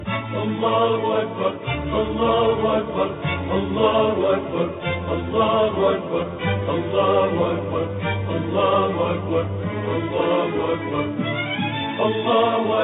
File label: allahu akbar song